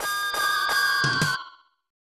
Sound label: Battle Intro; Metal Max 2; snes